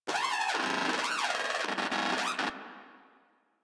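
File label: Moa Aggressive Vocal Enemy Spotted; Warframe; Pets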